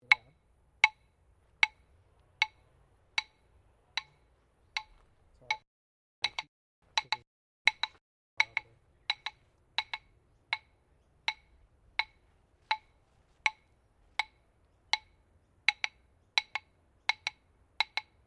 Clap Sticks (Bilma) Medium Sequence, Traditional Australian Aborigine rhythm sticks; Miscellaneous Musical